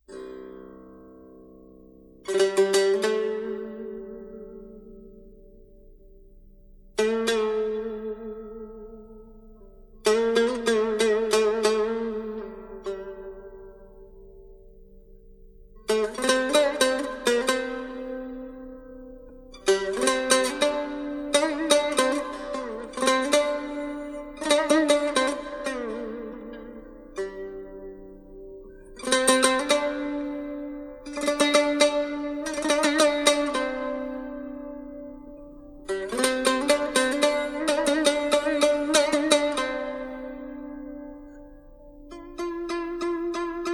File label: Tanbur; Tanbur nolasi